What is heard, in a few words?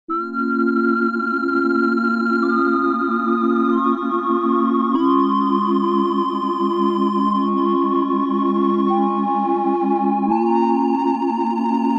Oberheim OB-8; Factory Patches; ST. GENEVIEVE